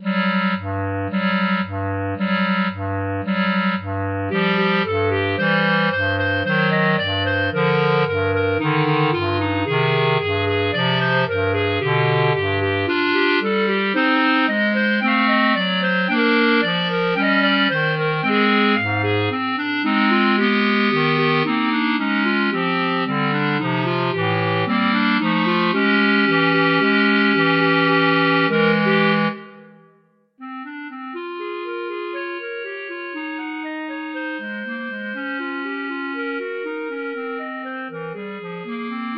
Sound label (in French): danse paysanne; mikrokosmos